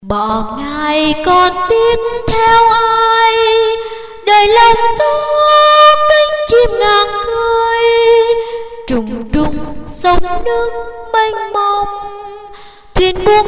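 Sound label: soprano alto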